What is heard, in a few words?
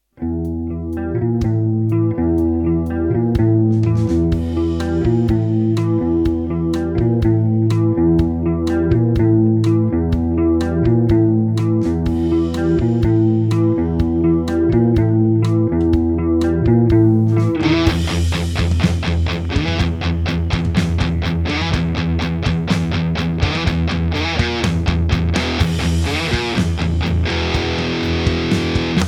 Chorus